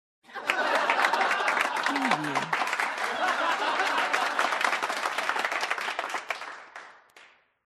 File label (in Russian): смех